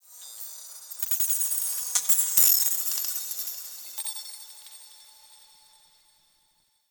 Glass Texture Small; Warframe; Ambience; Cephalon Weave

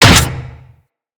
Jackal Step Metal, Machines; Steps

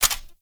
Bow Latch; Grineer; Weapons

